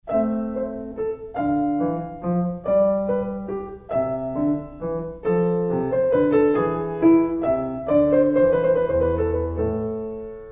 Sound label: Minuet in A minor; Krieger